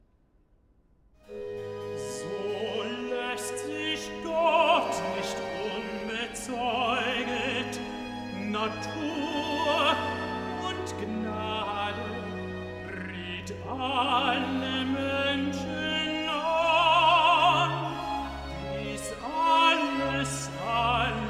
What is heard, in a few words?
Cantata, Recitativo (Tenor); BWV 76 'Die Himmel erzählen die Ehre Gottes'; Bach; Paris; 2nd Sun After Trinity